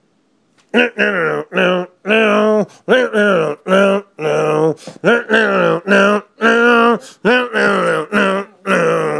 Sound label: riff